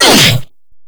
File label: Grineer Hammer Boss Pain A; Warframe